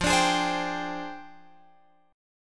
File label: F Minor 6th Add 9th F down Guitar Open F; strummed